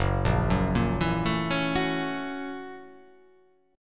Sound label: F sharp 7th Sharp 9th